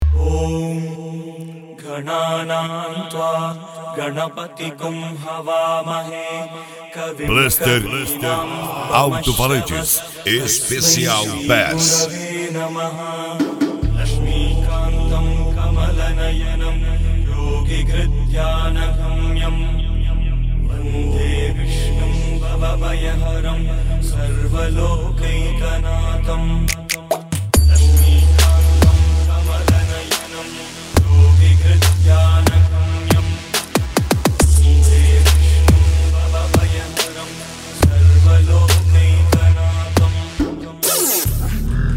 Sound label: bass; bluster alto falantes